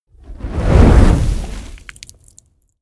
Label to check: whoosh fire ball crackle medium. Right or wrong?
Right